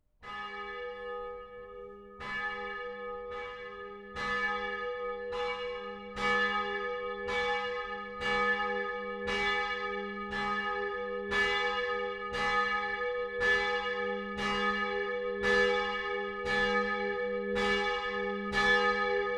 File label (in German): Glocke IV; st. paul furth